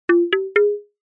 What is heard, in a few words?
cartoon footsteps short sneaky wooden mallets; zapsplat